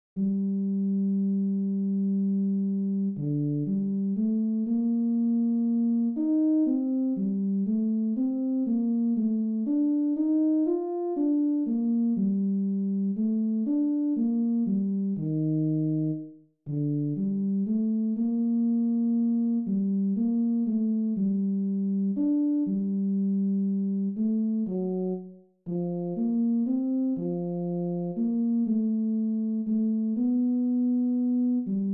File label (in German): tenor